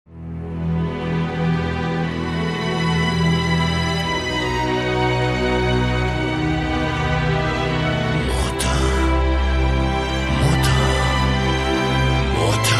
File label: ring